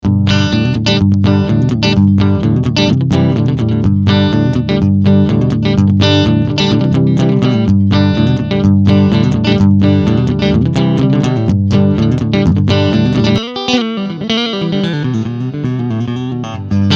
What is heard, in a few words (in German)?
Country Picking Stegpickup